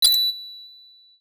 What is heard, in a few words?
successful Action